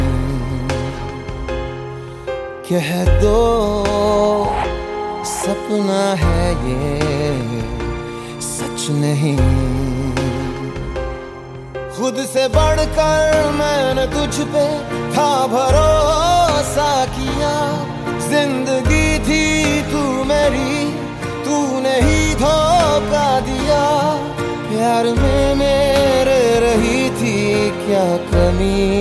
hindi ringtone, bollywood

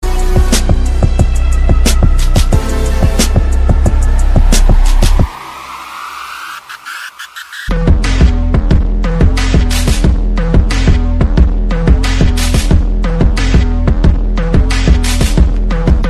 samsung ringtone; a 30

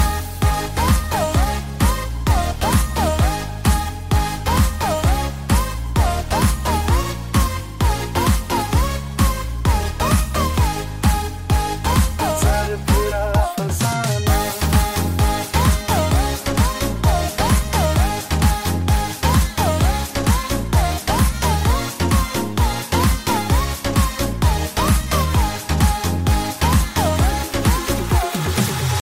latest bollywood dj remix music ringtone

